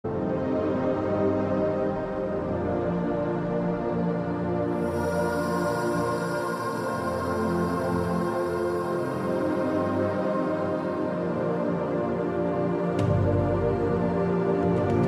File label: Trailer Music Ringtone; Avatar 2